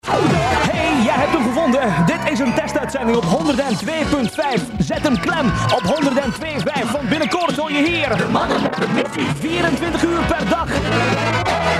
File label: jingle